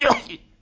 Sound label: piglette collision; Angry Birds Seasons